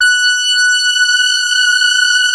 FAT LEAD, Powered DX; Wizoo

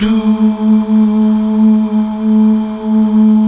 Mal Duh; Best Service Gigapack 1

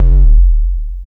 sub, sub bass; Techno ID; subbassdrums

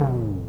Tom Hiss Dive; Lost Tapes From Mars

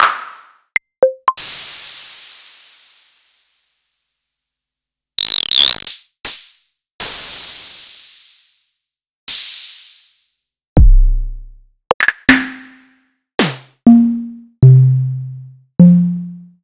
Concrete Serge Drums

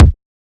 Future Bass Kick

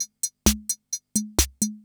CR78

